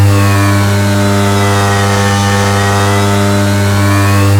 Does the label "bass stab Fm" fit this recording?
No